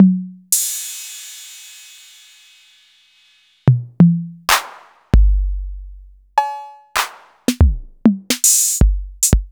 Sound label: TR-808 Morph